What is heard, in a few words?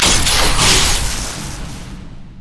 gravitation field; LoL; viktor